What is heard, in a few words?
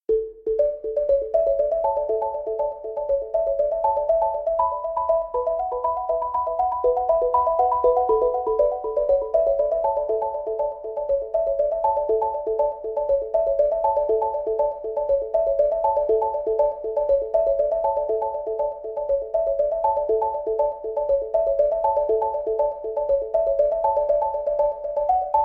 marimba